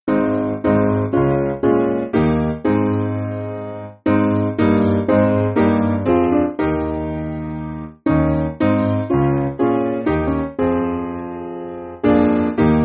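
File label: Piano, Backing Files for Churches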